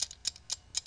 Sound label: Ticking; HoppiX